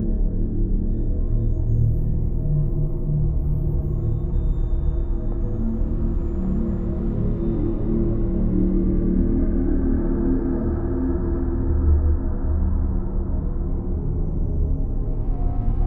ship habitat idle; ships; Stellaris; toxoids

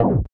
invalid action, ui; Stellaris

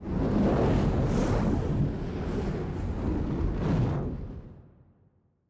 kaiju superweapon fire swirl; biogenesis

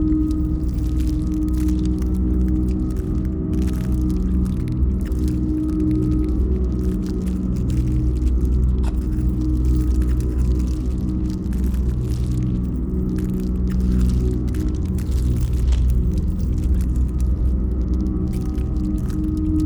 ship starbase idle; Stellaris; biogenesis

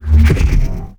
shield hit; weapons; Stellaris

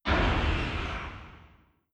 distance missile fire; weapons; Stellaris

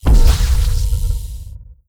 stellarite attack plasma; Stellaris; guardians

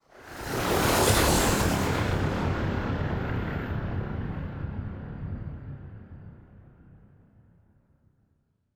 ship battleship move; Stellaris; machineage; ships

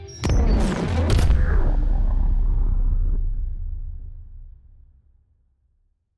ship titan move; ships; Stellaris; shroud

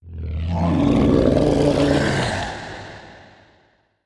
dragon attack mouth; Stellaris; guardians

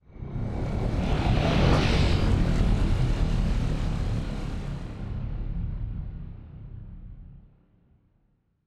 ship colony ship move; Stellaris; machineage; ships

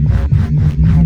arc emitter small windup, weapons; Stellaris

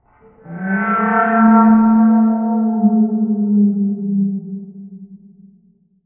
elder tiyanki idle vocal; Stellaris; distant stars

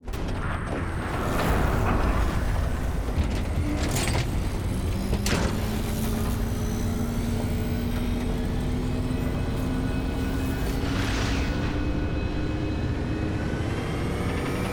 ship colossus open; Stellaris; toxoids; ships

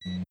incoming ai message beep; Stellaris

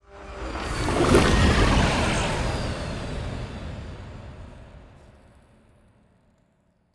ship titan move; ships; Stellaris; toxoids

